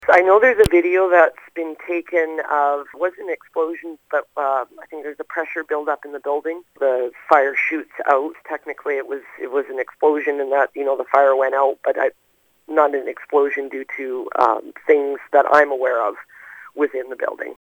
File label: explosion; spectacular fire in nanaimo